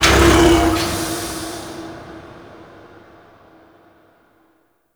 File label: Dark Machinery Air Burst; Warframe; Tau